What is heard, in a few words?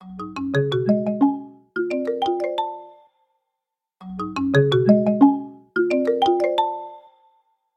marimba, alarms; Google; Google Sounds; Reality Bytes